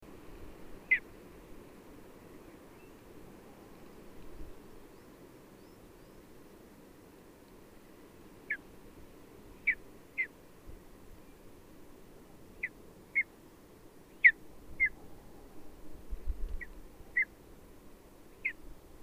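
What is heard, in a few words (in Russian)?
malyy podorlik golos, lesser spotted eagle voice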